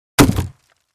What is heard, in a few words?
Crate Palette Type 2 Hit with Sledge Hammer